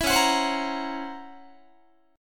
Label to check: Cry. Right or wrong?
Wrong